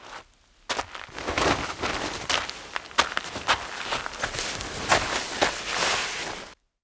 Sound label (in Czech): kroky; Mafie 1